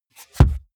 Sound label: car bmw e46 interior sun visor shut